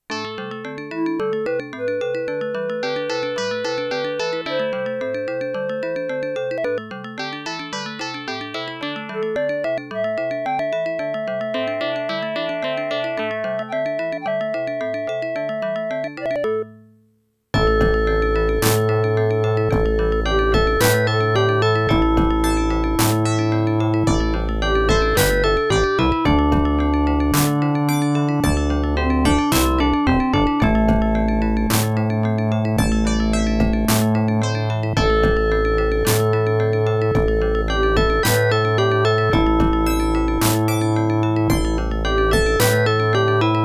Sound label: Adlib OPL3SAx; Addonics; Budokan